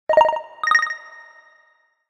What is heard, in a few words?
Ring Bounce; HTC HD2